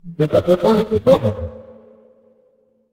fire electric arc

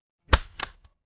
office stapler

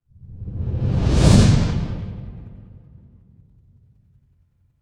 fire electric whoosh flame

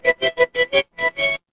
feedback achievement

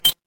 ui menu

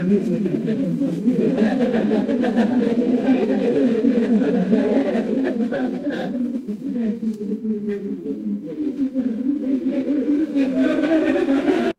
crowd laughter

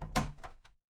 door open

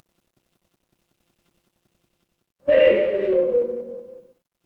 ambience room tone

